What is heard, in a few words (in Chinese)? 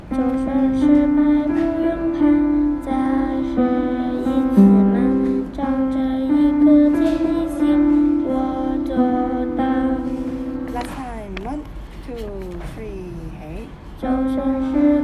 舊曲新詞